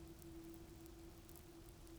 pulses, BALAENOPTERA; MONACO 2022-07

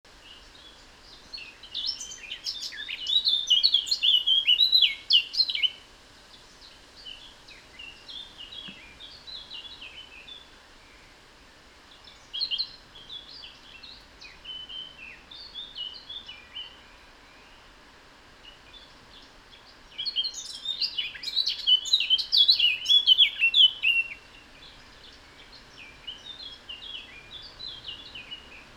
Sylvia atricapilla; SETE

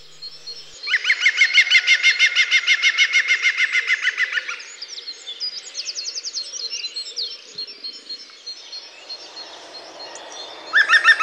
picus viridis, bird

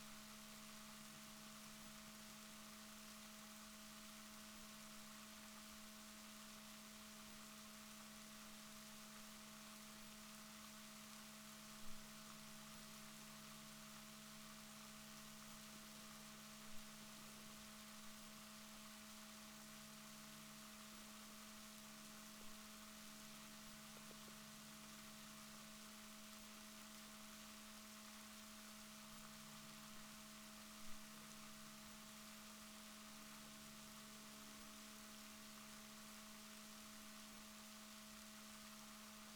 rorquals negatif, Balaenoptera Physalus Chile

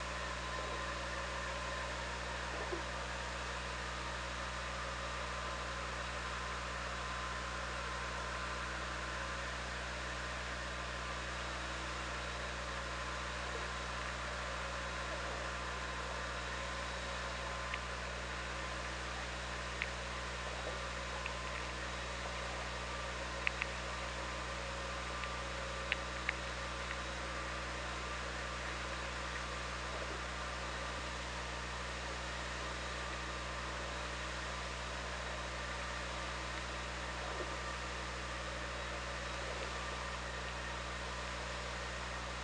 OrcaLab

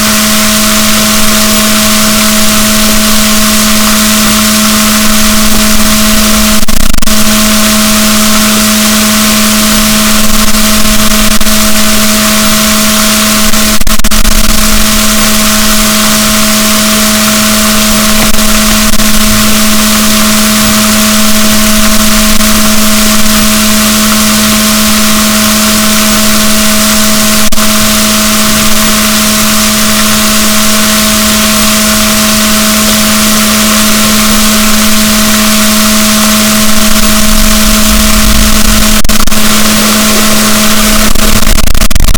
rorquals negatif, boat

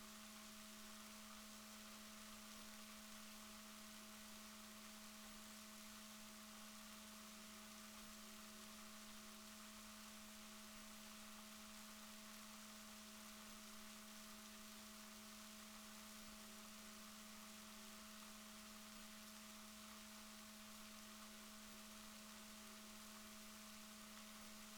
rorquals negatif, Balaenoptera Physalus Chile

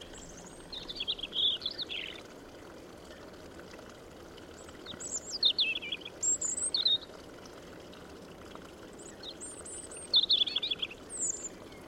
Erithacus rubecula; SETE

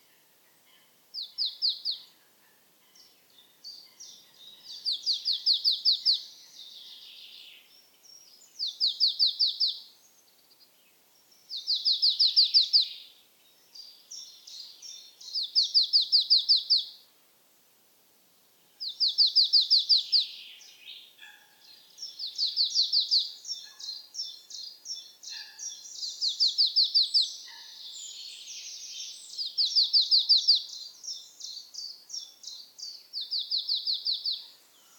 Poecile palustris; SETE

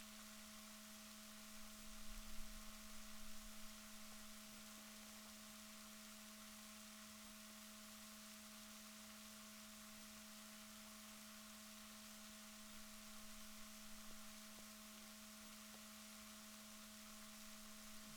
rorquals positif, Balaenoptera Physalus Chile

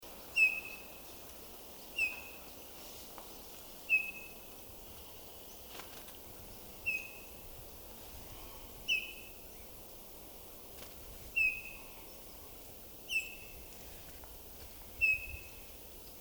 Pyrrhula pyrrhula; SETE

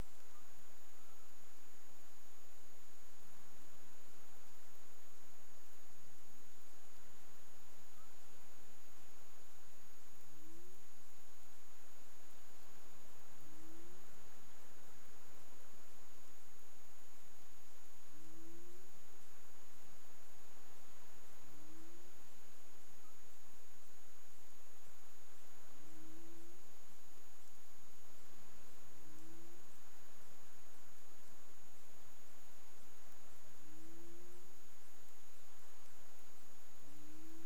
humpback whale; CARIMAM

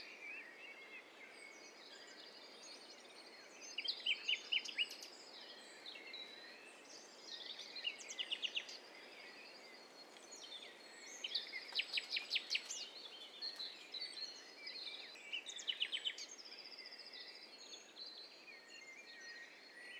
STEREO BIRD COCKTAIL PARTY

